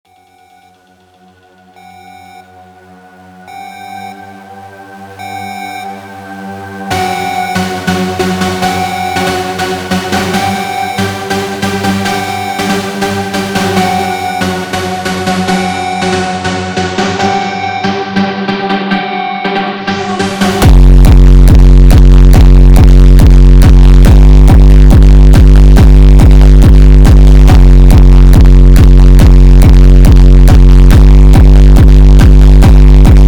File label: superbass